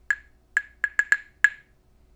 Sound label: claves